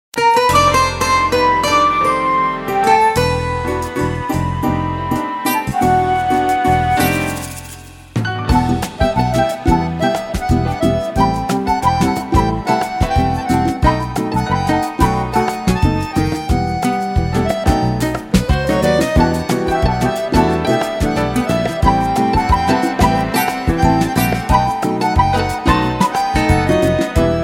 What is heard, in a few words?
BOSSA